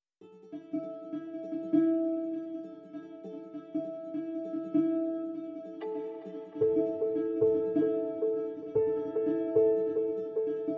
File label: Neutral Lights Emerging No Bass